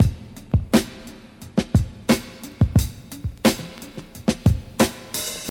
88 bpm fresh breakbeat C# key, Free drum loop - kick tuned to the C# note